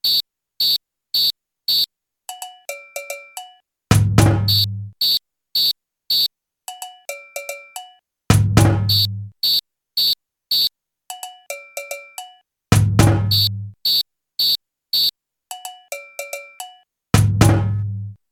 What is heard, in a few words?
Ijexa break into section, samba